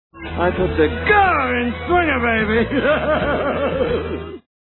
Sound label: grrrin